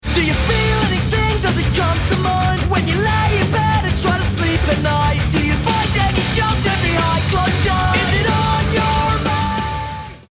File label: bomb ten sec count